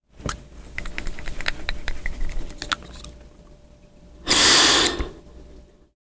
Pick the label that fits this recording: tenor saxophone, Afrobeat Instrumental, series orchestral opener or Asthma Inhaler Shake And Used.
Asthma Inhaler Shake And Used